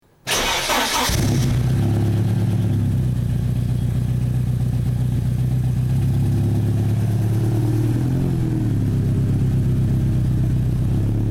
944 TurboCup demarrage, porsche

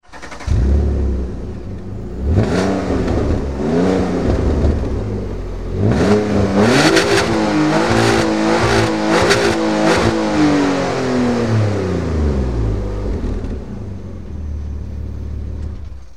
156 GTA point mort; alfa romeo

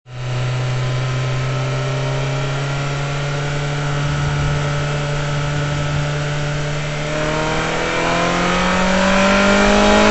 F355 - reprise 3eme, ferrari

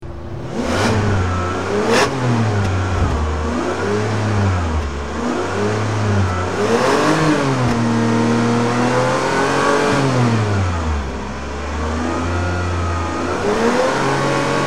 575M Maranello - point mort; ferrari

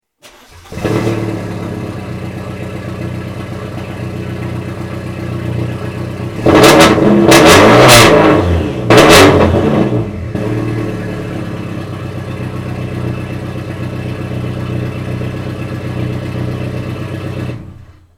944 TurboCup echappement libre, engine sounds; porsche

